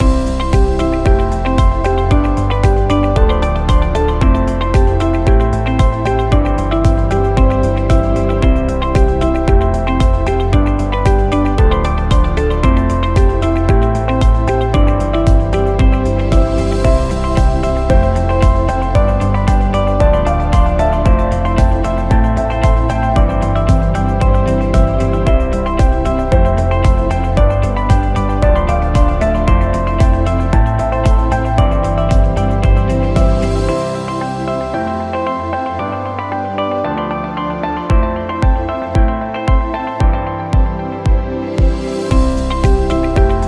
A Corporate Loop; technology

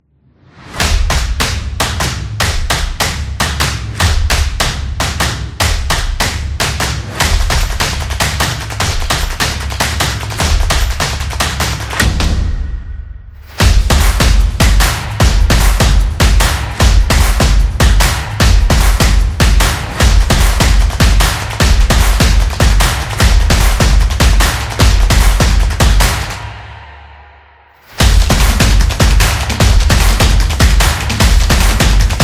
The Drumming

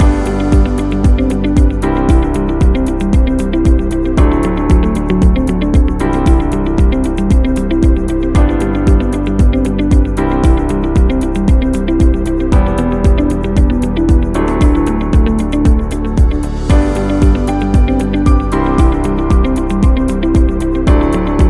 Corporate Loop Business; waiting music

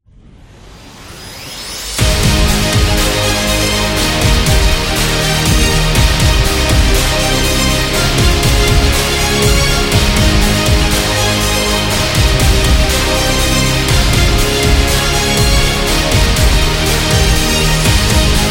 Epic Cinematic Motivational; epic music vol 4

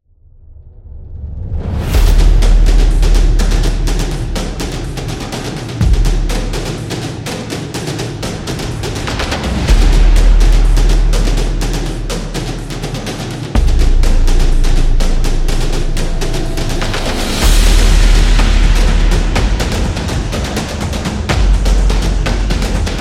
Epidemic, tribal dance

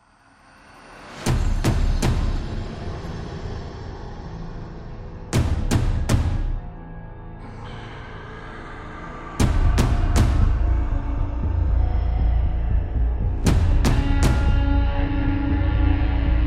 Suspense Drums

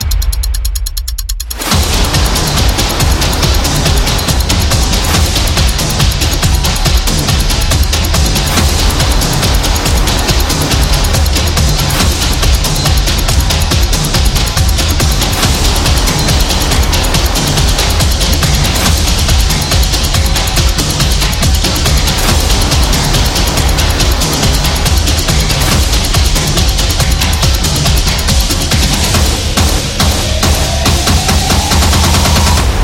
Action Sport; monster attack

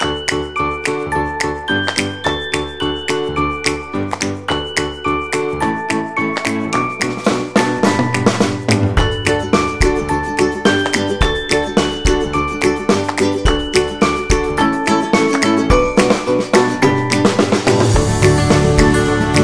Corporate Holiday, corporate music; corporate music vol 10

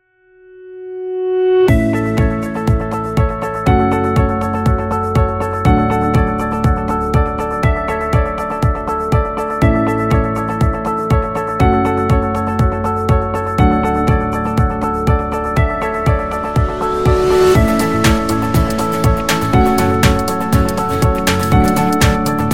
Corporate Name, corporate music; corporate music vol 6

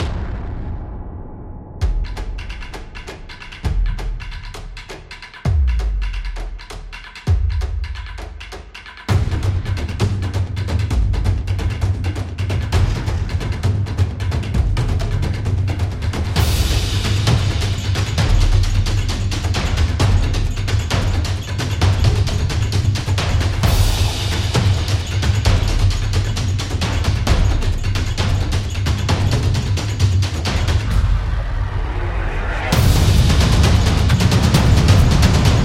Tribal Drums Trailer; tribal dance

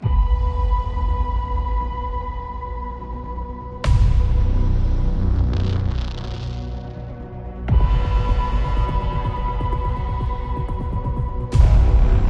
The Suspense

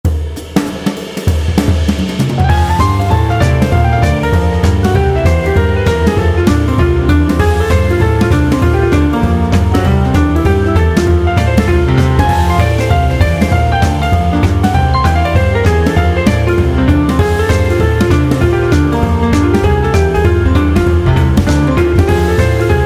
Fast Jazz

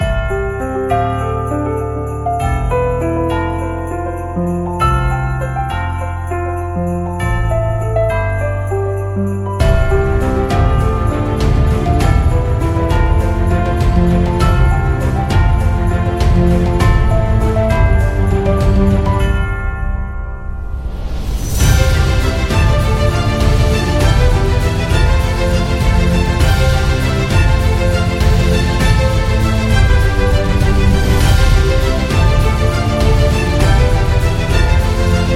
A Christmas; xmas

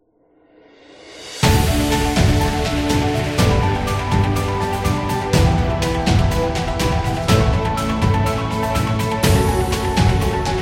The Motivational; business background

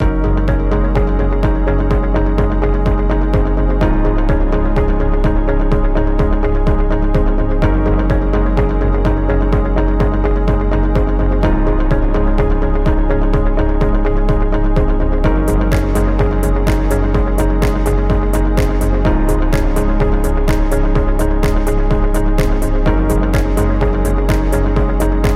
A Corporate Loop Upbeat

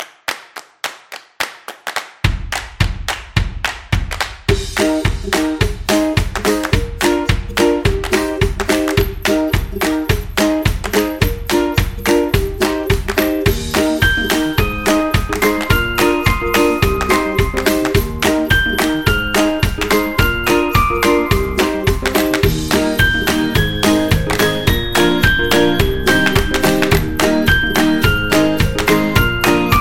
Happy Acoustic Folk, happy music; happy music vol 3

